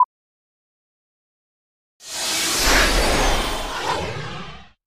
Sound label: Startup; AT&T